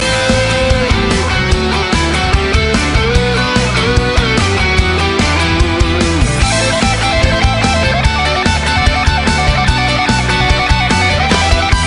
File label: heartstrings guitar solo